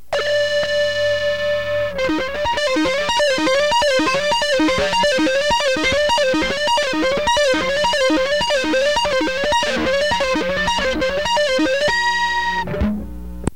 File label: guitar